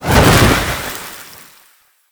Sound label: Manic Teleport In; Warframe; Grineer